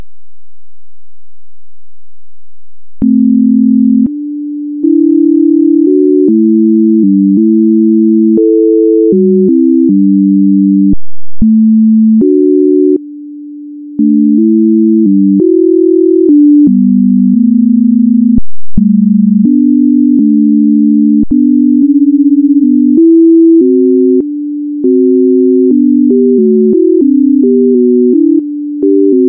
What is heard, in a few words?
g maj